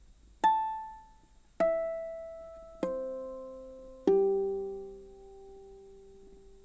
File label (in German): flageolett, ukulele; koaloha